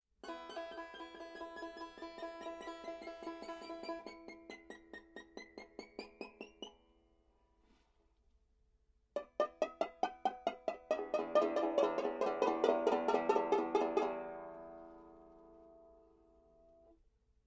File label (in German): bottelneck getupft, banjo